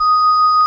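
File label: Vox D#5, organ Continental; Keyboards of The 60's and 70's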